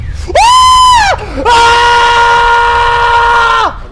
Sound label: screaming